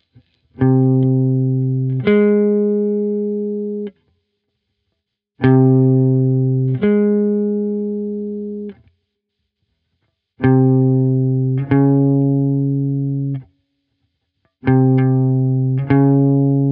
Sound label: chromatic intervals